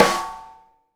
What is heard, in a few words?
SNARE, Live Snares